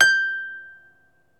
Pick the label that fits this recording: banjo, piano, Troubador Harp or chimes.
Troubador Harp